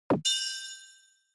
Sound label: Onoma Surprise Low